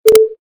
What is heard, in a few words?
wrong